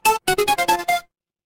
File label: retro game game over